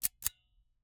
Icecream Scoop, metal, mechanical, teeth, gear, squeeze, release; Eiravaein Works; Vaeyan IV